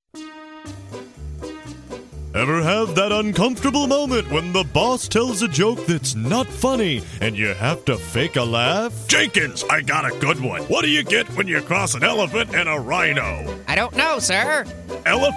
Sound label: laugh